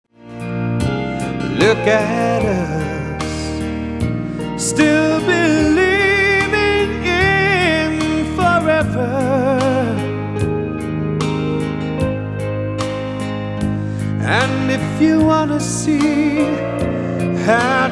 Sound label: Country ballad